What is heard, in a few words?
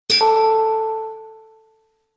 Confirm; ParasiteEve2